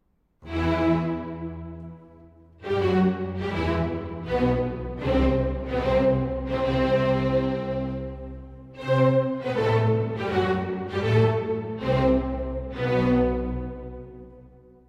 Piano Concerto No. 4 in G Major, Op. 58 II. Andante con moto